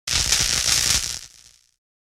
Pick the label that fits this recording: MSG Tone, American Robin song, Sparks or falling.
Sparks